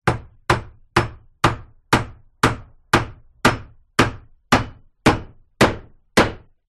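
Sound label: Hammer Nail 4 Hits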